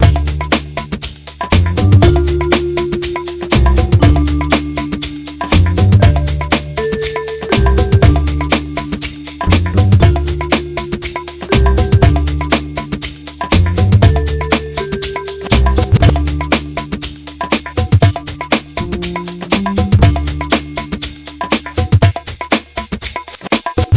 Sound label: Map Loop Music; Vegas Casino High 5; Nintendo DS